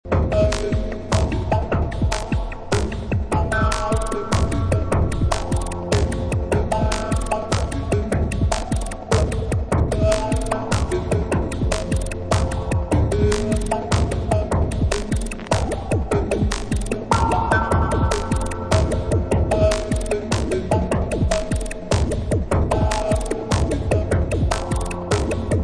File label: Glitch